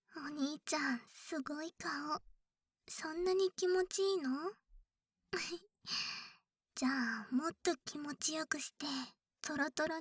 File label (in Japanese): 【右】指耳かき(元左)